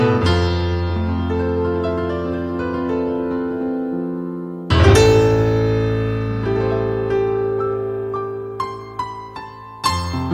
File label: Piano